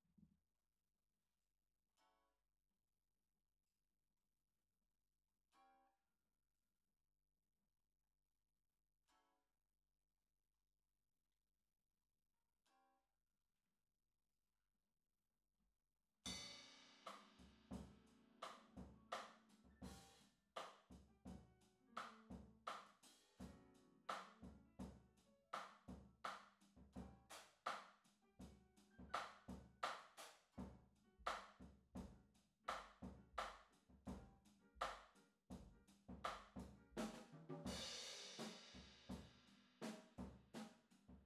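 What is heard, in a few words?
guitar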